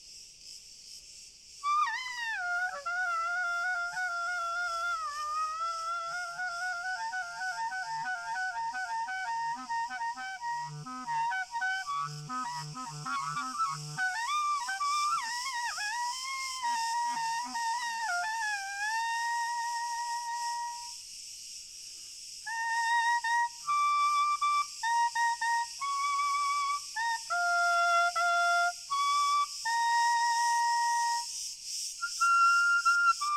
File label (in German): Frei OTon Spiel Grillen Chor Fern